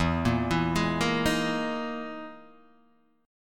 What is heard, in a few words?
E Major 7th Flat 5th down Guitar DADGAD